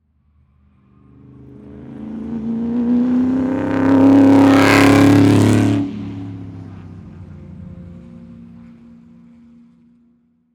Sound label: Transportation Car Race Car Drive By Straightaway